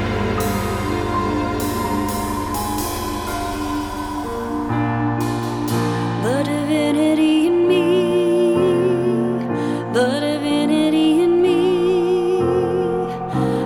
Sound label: Divinity Chant